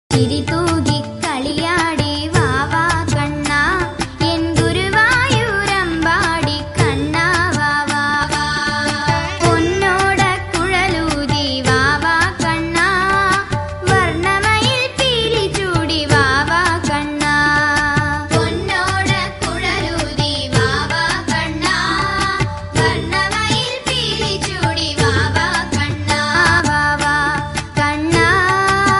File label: baby Tamil song